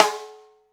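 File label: snare, EMU Orbit-3 drum machine; 300 Drum Machines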